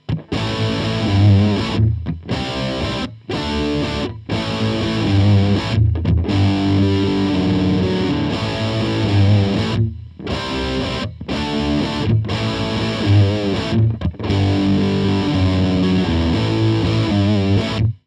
klang test high gain, Shure SM57; sdm-57

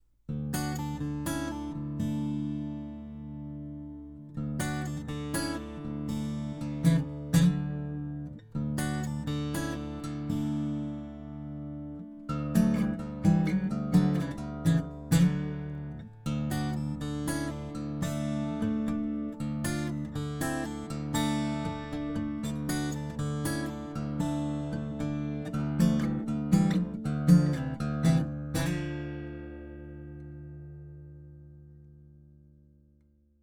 picking 12 bund schallloch; fame pro series sd-100 test; niere

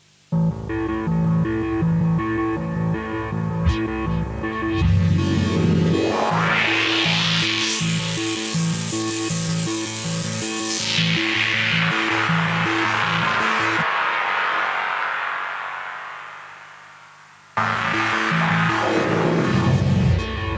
m-audio venom klang

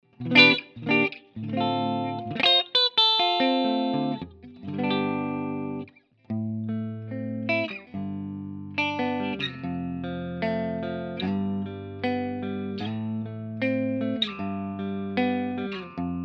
gibson les paul traditional clean hals und steg

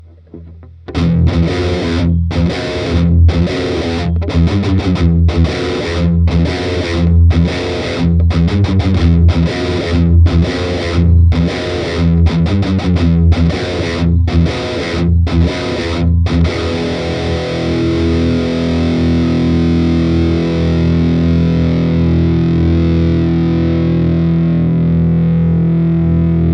Crate FW15R overdrive gain full aktive pickups

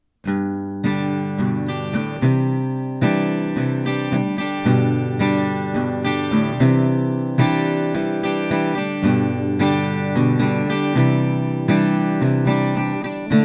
audio-technica at2031 tendenz schallloch

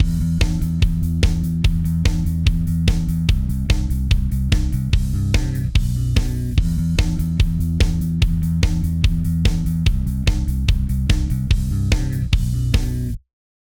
sidechain in reaper vorher-nachher

